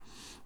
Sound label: breath heavy out; Apotheorasis